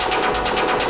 133 bpm harsh spacious beat

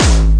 psycore kick snare; drum machines

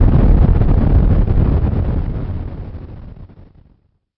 explode; VIDEO GAMES; spacewar

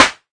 melbourne bounce Clap; Hip Hop; STYLE KITS; D3ron Melbourne Bounce Kit

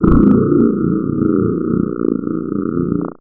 giant reso bass

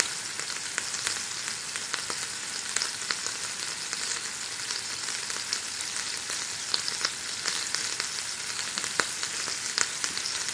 Food Fry; UNUSUAL KITS and FX; ModeAudio

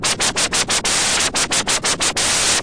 noise MiniBrute, Noise Sweeps

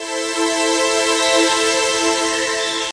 one bright pad; Pads

